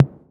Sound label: 808 SOFT; Wizoo - Platinum 24 Electronic